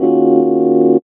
Chords Tones; Equipped Music; Loungin House

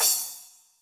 C Splsh, Drum Machines, Yamaha DTXpress, Cymbal

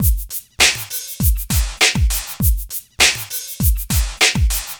Smooth, R&B Drums

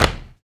SUB, Human Perc, Body Perc; Spectrasonics; Supreme Beats; World Dance

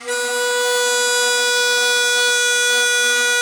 BON DI, Winds, Old World Instruments

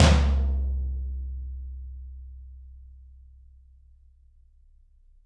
Toms, Drums; Bob Clearmountain Drums I

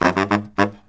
BARYT, Killer Brass Riffs

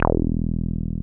303 E2 mf; Drums In Your FACE!

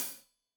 Hat Loose, Hi-Hat, Cymbals Stereo

